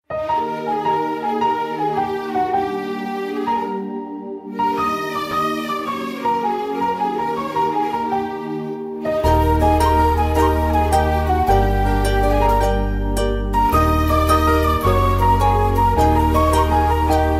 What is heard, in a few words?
namma veetu pillai love bgm; crush bgm